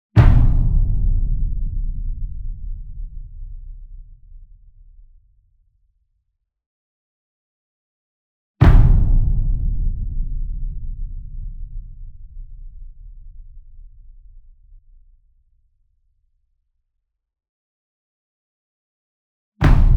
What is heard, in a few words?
SINGLE DRUM Verdi 66 Inch Flam Soft Beater Near; boom library; Cinematic Strikes Construction Kit